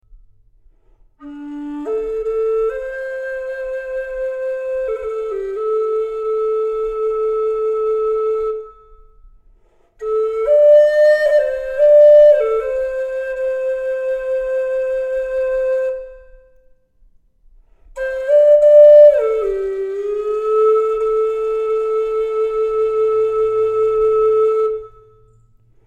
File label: Top O Cathedral Rock flute